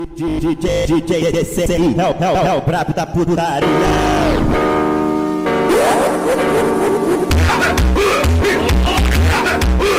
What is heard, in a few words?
brazilian phonk